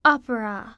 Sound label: Sound Opera; The Interactive Alphabet